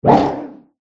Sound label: haosa wound